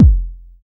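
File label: 909 DEEP kick, TR909 KIK; Drums In Your FACE!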